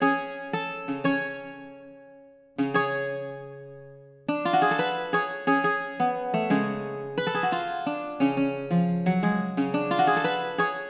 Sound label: Bouzouki; Hip Hop Exotica; Apple Loops; Big Fish Audio